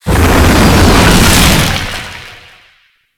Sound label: Space Fire Debris; Warframe